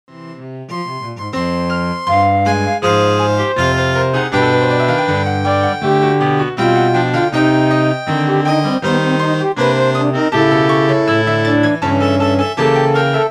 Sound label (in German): Quintett